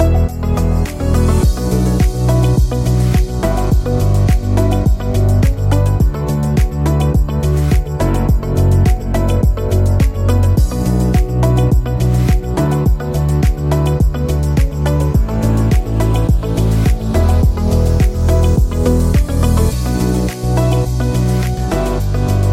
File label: Business Theme